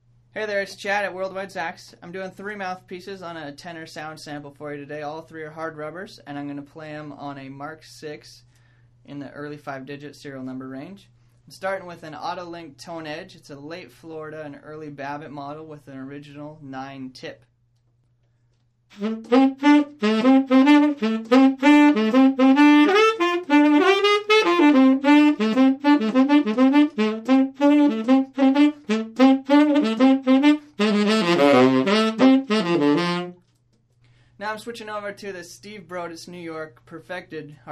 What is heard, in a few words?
tenor mouthpieces; link, broadus, berg